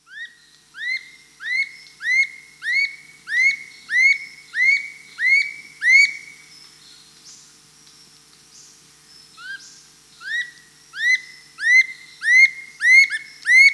Dull-capped Attila, Attila, Nature's Songs, S. America; Attila bolivianu